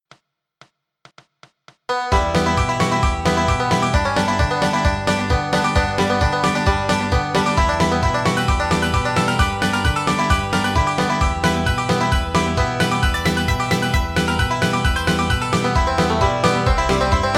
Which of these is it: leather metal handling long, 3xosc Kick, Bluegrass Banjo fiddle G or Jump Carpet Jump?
Bluegrass Banjo fiddle G